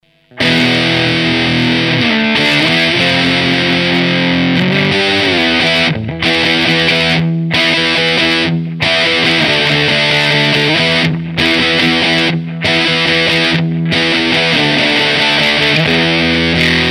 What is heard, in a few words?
metal